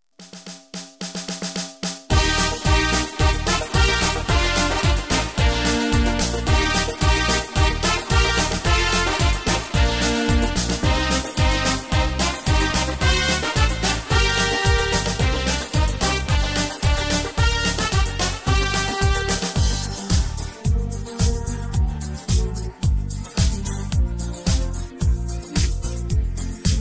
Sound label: ukrainian songs no vocals